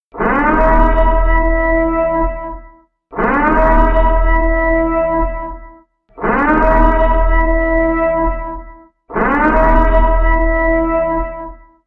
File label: Anarchy Siren Sounds Car Horn